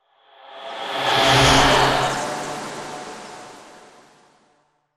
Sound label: Flyby Tree